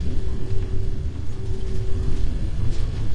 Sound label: loop Regen; loops; Mindustry